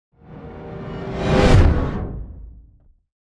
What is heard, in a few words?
Cinematic Woosh